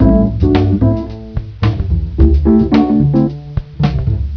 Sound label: jazz; svencoop